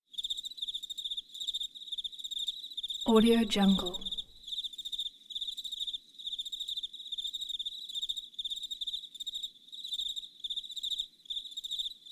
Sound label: crickets night